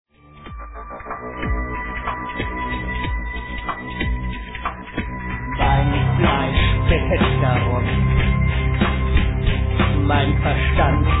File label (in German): kopfstimme